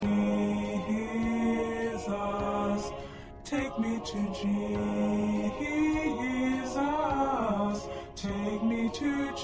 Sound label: Low Voice